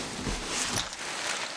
wounded drag; Titanfall 2

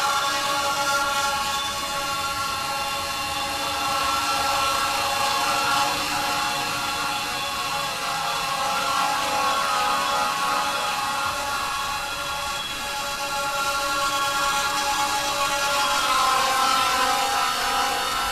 Drone Hover Close; Titanfall 2

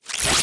imp bullet energy bubbleshield; Titanfall 2

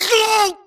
grunt death neck; Titanfall 2

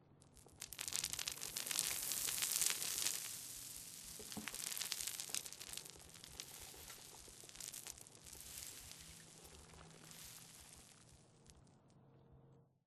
Rock Debris Small Long; Boomtown; Titanfall 2

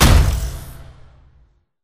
Sentry Turret Deployed; Boost Card; Titanfall 2

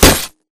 bullet light ballistic solid glass close; Titanfall 2

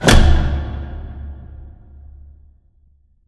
cooling panel start; Titanfall 2

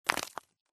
bullet light ballistic concrete debris; Titanfall 2

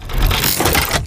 Servo Jog; Titanfall 2

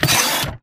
Mvmt Servo Head pivot Short; AI Stalker; Titanfall 2

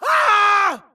grunt death crush; Titanfall 2

